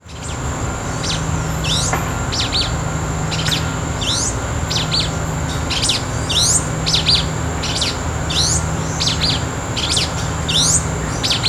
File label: Ramphocelus carbo; Manaus; AM BR; A Silveira